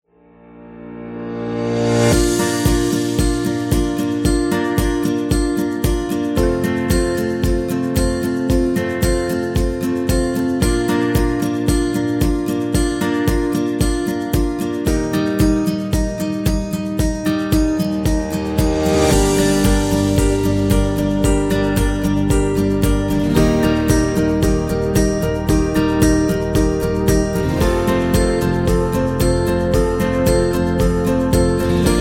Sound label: Emotional Acoustic Guitar; wedding music